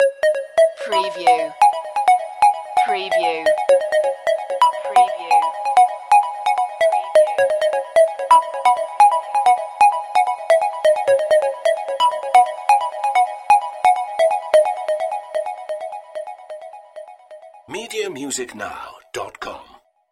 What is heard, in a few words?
crystal rain crystal synth